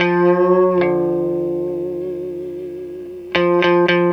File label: GTR2 L, Roots Disco; USB Soundscan vol.32; AKAI